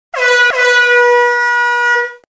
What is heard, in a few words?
antibonus